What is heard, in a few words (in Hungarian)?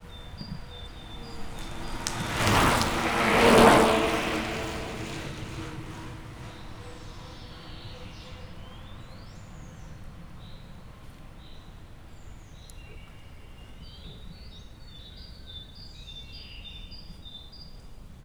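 kettő lefelé, kerékpár túra; visegrad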